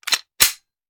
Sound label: Hand Gun Foley; Sound Ideas; Guns